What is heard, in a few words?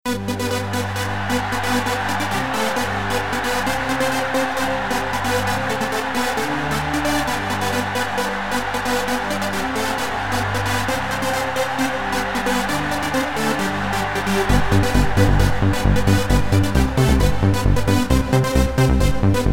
jingle bells song